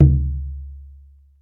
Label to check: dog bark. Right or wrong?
Wrong